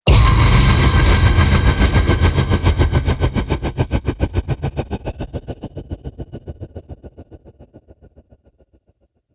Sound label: Hit Epic; Ninja Tracks